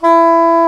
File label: SAX SOP MF E, Soprano Sh; Roland LCDP07 Super Sax